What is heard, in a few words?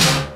HARD TRSH, TECHNO RAVE; Pro-Rec - Synthsonic